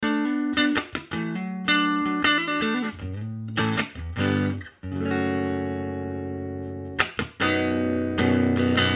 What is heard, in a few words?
clean